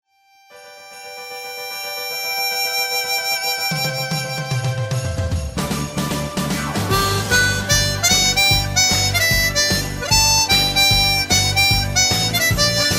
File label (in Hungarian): harmonika; adventi